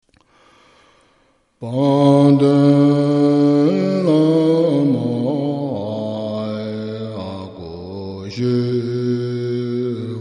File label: Chanting form Heart, Supplication to the Lamas